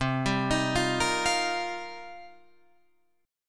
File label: C Minor 11th, C, down, Guitar, Open F; arpeggiated; Cm11